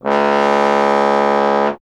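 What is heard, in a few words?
bass trombone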